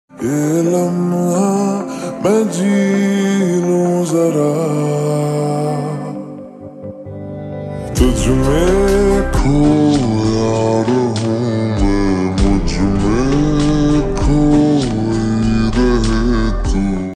slowed and reverb lofi song lofi; lofi efx

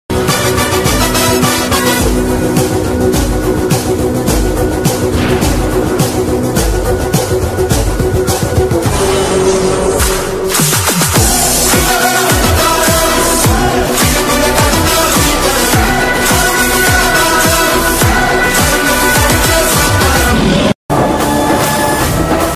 attitude bgm; veer simha reddy; nandamuri balakrishna

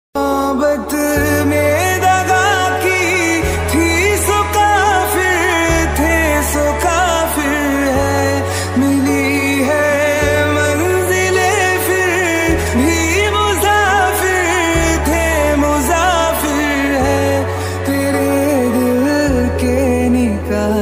sad song ringtone

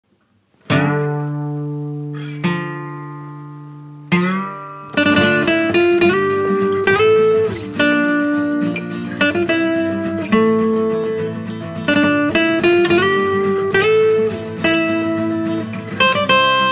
hero introduction bgm guitar cover; Khaleja

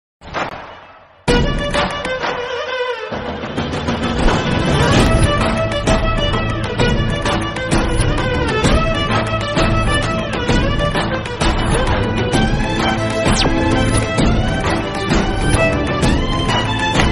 trailer bgm; manchi rojulochaie movie

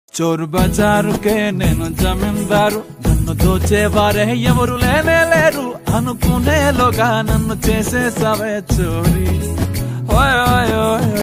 song ringtone

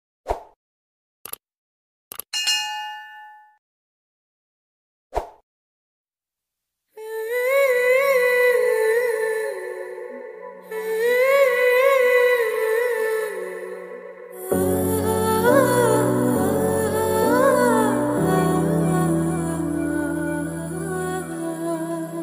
love south indian

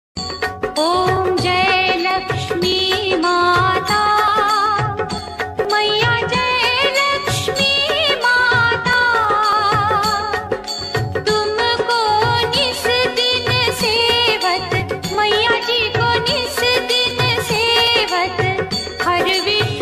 lakhmi mata aarti bhajan, ringtone; laxmi mata; om jai laxmi maa

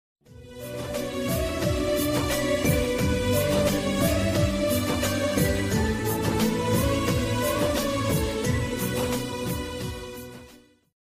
odia love romantic ringtones odia song ringtone; prem kumar; anubhav

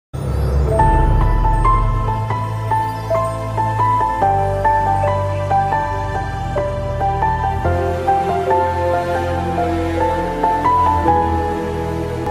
ringtone new instrumental ringtone

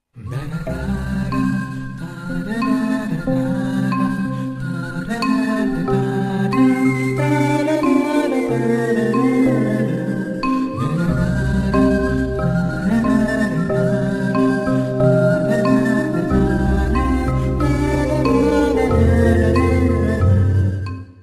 love bgm ringtone tamil bgm ringtone; mounam pesiyadhe; surya

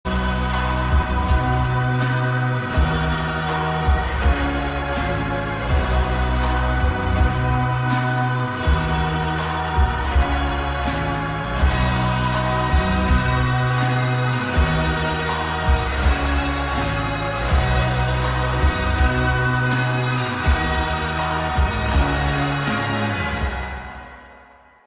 x instrumental sped up

